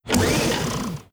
somethings missing alert; Hearts of Iron IV Death or Dishonor

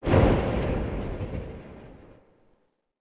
Goodyhut Metal; Sid Meier's Civilization 4 Complete; Beyond the Sword

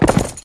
horse canter; Mount&Blade Warband

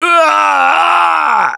Death Scream, Survivor Voice; Gambler; russian

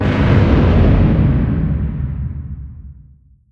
distance ship hit big effect; Hearts of Iron IV Death or Dishonor

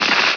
build spark; Emperor - Battle for Dune

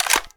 sniper military slide back

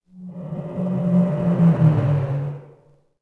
City Flyby Med; Ambient; Sid Meier's Civilization 4 Complete; Beyond the Sword

